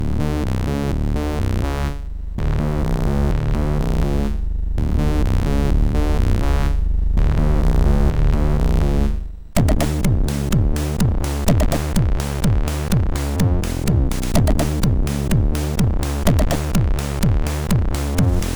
sid MOS6581R2

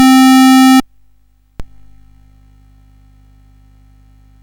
Dragonworld sid CSG8580R5

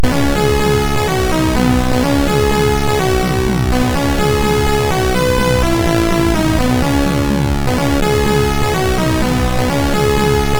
Burp Intro, MOS6581R2

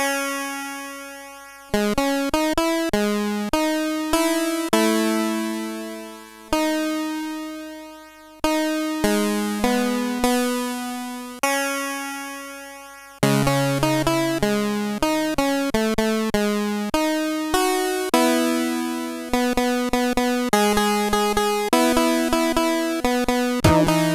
Mini Raiden Cover sid CSG8580R5; Black Turk

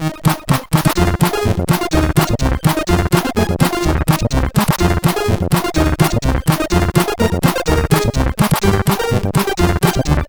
Short, CSG8580R5

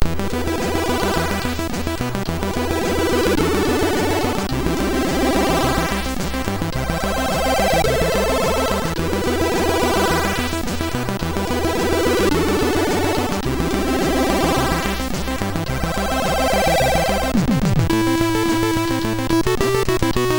Swamp Area, sid MOS6581R2

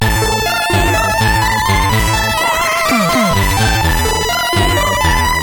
X-Music Remix, CSG8580

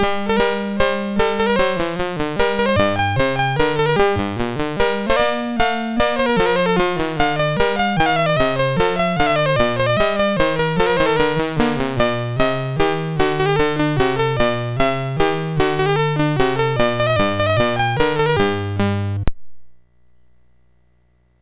Polonaise, MOS6581

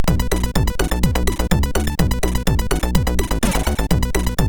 Acieeed, sid MOS6581R4

